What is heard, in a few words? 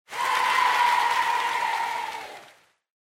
Cheer Yay, Mid Applause